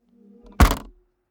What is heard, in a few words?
car bmw e46 exterior trunk close